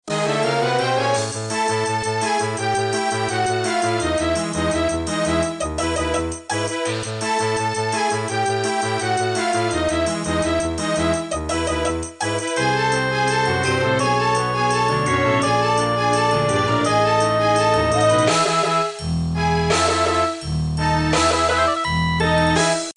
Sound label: castle bg; gay dream